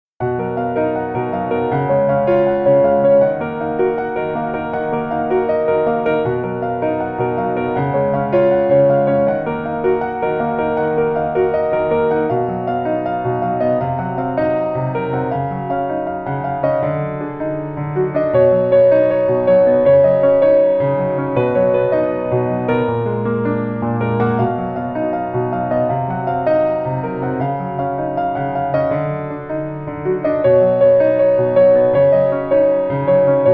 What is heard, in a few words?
Instrumental